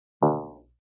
Dong; Dongs; multimedia

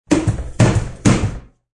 Trash Can Knock, Knocking a trash can; Cleaning; Household

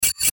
Clicks Mechanical Feedback Swell; Production Element Imaging Element Accent Transition; Multimedia; Blastwave

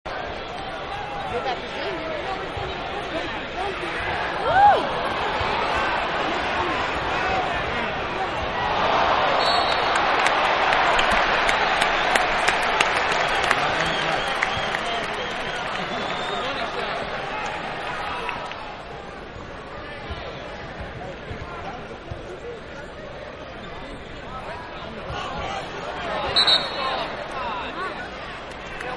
Crowd Sports Event; Backgrounds; Manhattan Production Music

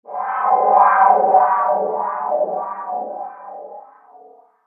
Haunted Wah Wah Pedal; Ghost Sound Effects; horror; Andrew Fleming

